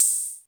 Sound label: CR78 OPHH; 90 sSampleCDs; Crypton - DrumBase5000